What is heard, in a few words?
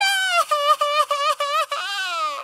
crying sound